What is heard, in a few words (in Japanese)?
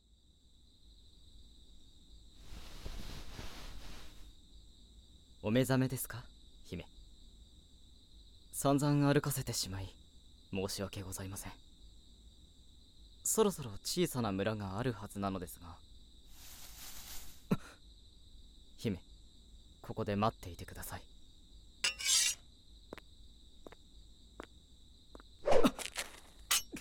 耳かき; 『アリヤレリフ～亡国の騎士～』